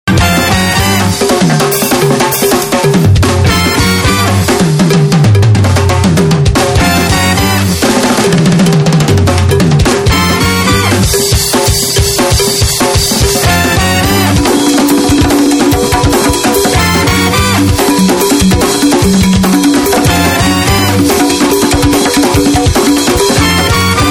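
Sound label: drums funk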